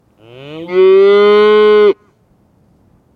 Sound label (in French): meuh meuh moo